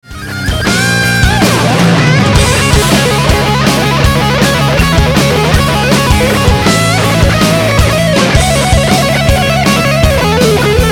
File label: legato